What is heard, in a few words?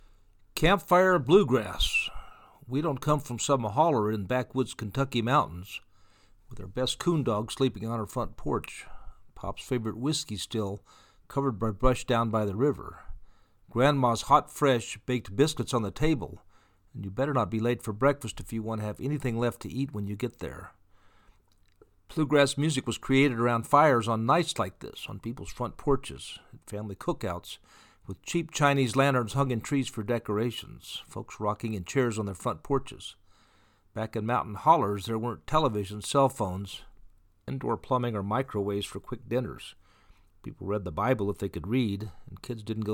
campfire bluegrass